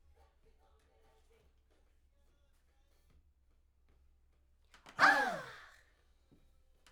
Backs; SCHOEPS

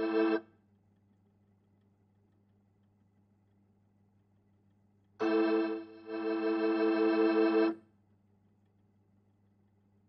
Hammond

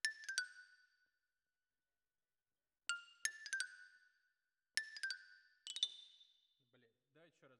Xylo Close

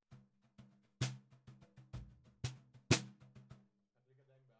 Snare Bottom

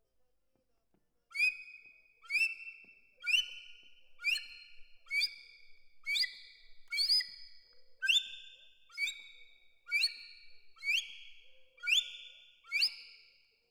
Perc Close

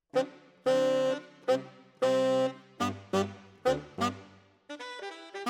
Tenor, RNR for piano

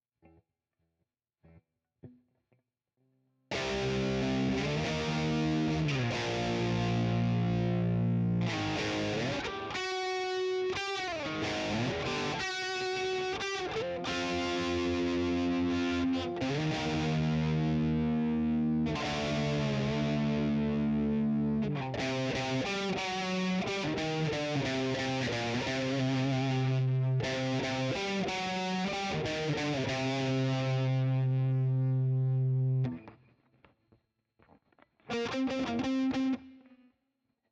Guitar 2

